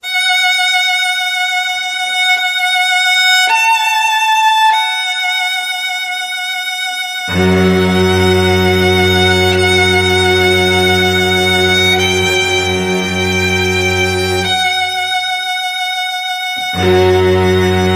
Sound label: Dead World Strings Only